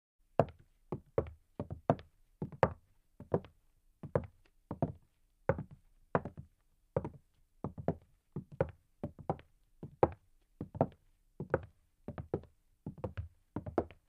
Footsteps On Hollow, wood

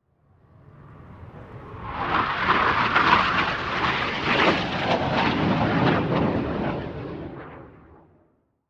Airplane MiG-29 Fulcrum by overhead jet

